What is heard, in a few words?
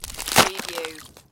Snow and Ice, Ice cracking with water underneath